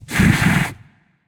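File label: "heh heh", nonverbal; smite; khepri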